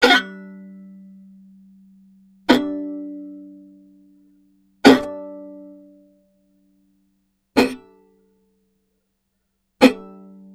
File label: Violin Extended Technique